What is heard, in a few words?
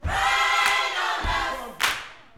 Vocal, Gospel, PHRASE; Spectrasonics